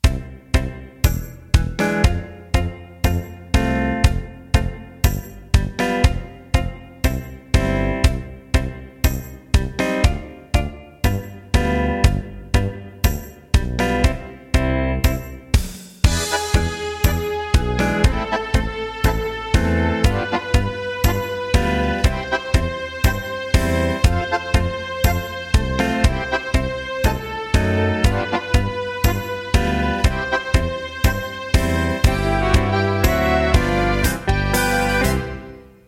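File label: Italian Tango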